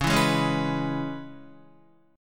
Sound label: Db Minor 7th, Guitar; strummed; JGuitar